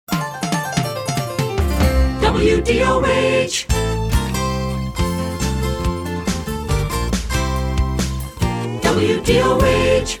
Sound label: Jingle; Short Attention Jingle Show